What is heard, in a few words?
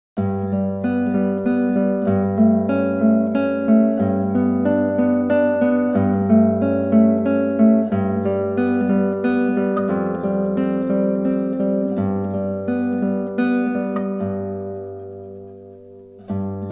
Finished Bells; Brown, J. - Bells